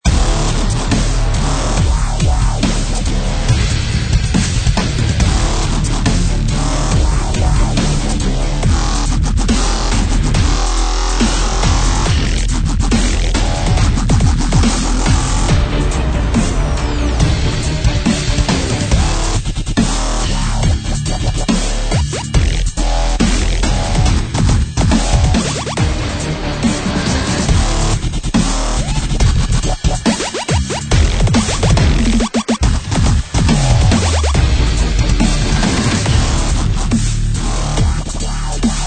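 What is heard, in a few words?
Epic Hybrid Dubstep Wobble Bass Loop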